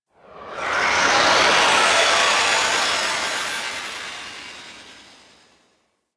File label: Survivors End Whoosh; Ambience; Warframe; Infested Eris; Encounters